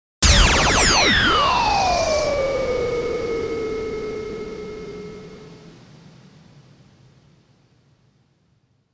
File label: HOWLING BUTTON IMPACT